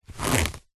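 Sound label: foley clothing jeans denim fly zipper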